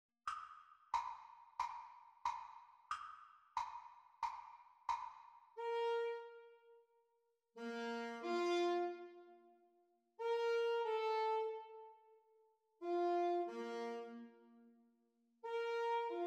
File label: Sax Duets Playalong; Exam Speed; Alto Saxophone duets